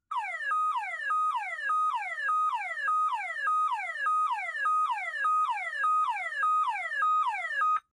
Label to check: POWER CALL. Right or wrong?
Right